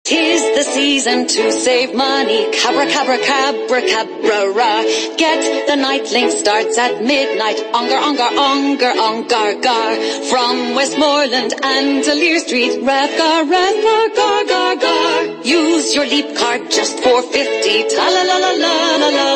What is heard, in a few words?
Jingles